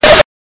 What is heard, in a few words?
beep beep